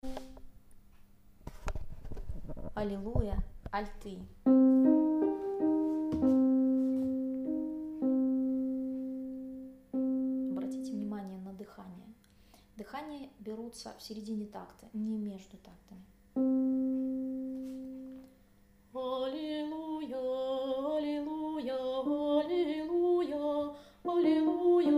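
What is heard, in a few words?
alto; Allilujja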